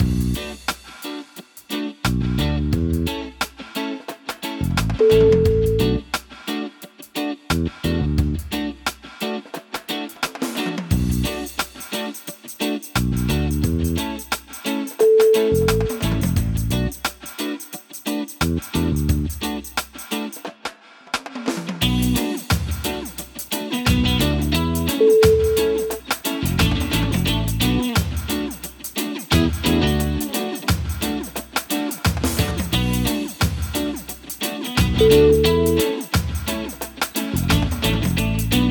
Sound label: happy reggae